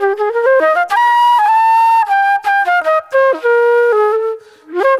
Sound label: NY 70's Jazz Flute